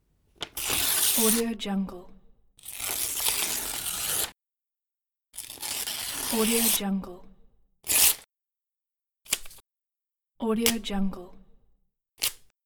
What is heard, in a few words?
tearing paper part; banepx